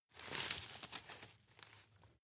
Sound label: rustle medium; foerster